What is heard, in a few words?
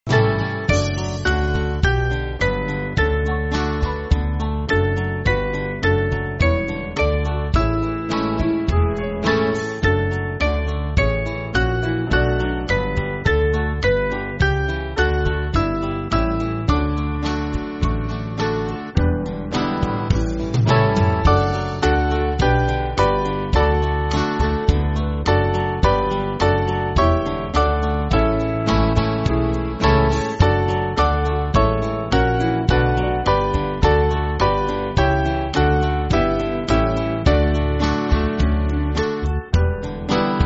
Band, Free Music Backing Files for Churches; Doncaster